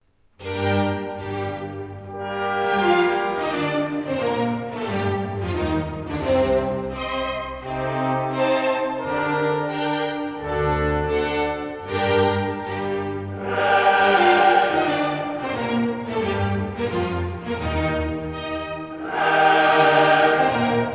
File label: Philharmoniker, Requiem in D Minor Rex tremendae; Live; Requiem K 626; Sequentia